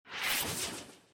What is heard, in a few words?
swish slicing